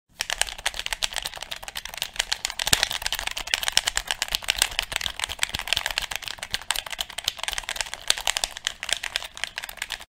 Game controller

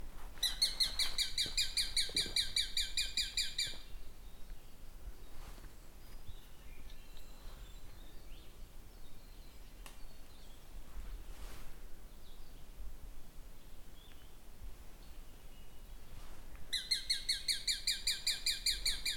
Sharp shinned hawk; پرنده باز ساقتیز

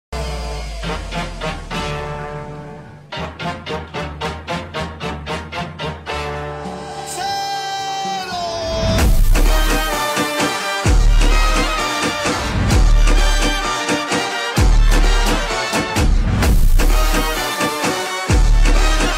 Mexican ringtone

